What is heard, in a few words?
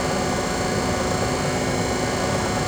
Settle Motor Small Loop; Warframe; Grineer Settlement